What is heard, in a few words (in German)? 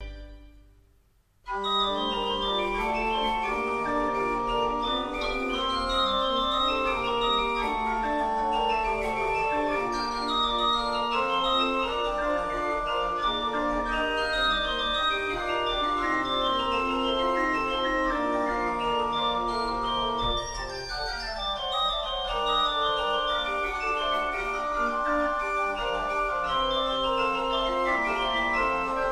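Godefroy Hartmann 17 II P, pfeifenorgeln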